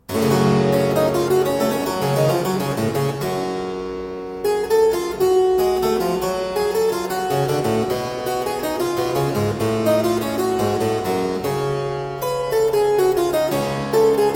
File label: Suite in G Minor - Prelude, spoken, Keyboard Music; William Croft